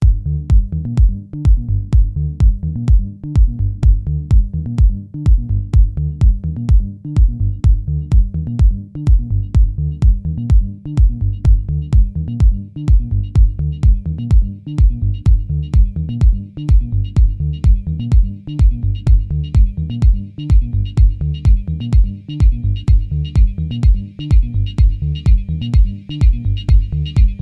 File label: Remix